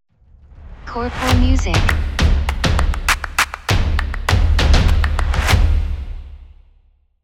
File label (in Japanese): jingle ウォーター